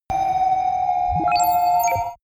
facility compute

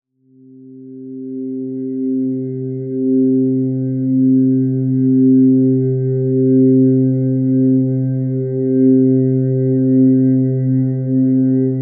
neutral drone sound

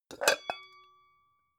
pan open hard

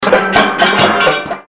steeldrum

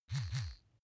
razor smooth double

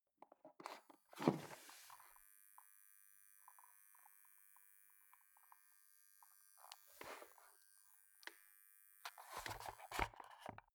foot switch dimmer slide up buzz down

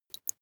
dripping single

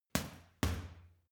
boxing punching bag uppercut double medium

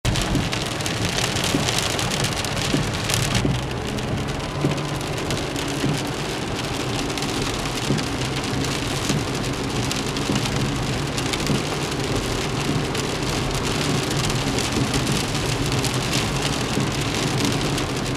car cabin inside rain

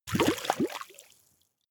swamp oneshot bubble

